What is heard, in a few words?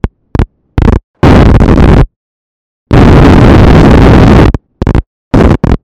crackle